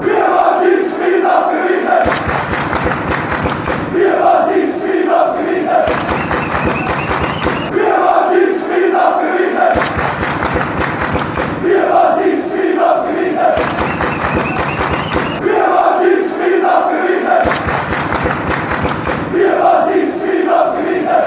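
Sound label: clapping, football fan chants; thun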